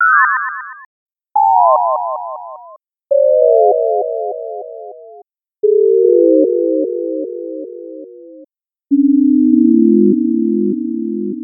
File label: Electronic Echo Loop